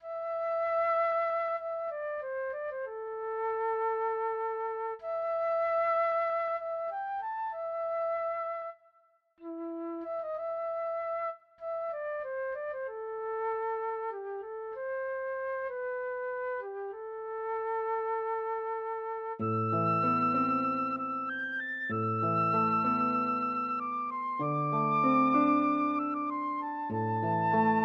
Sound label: Flute